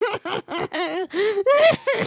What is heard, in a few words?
cry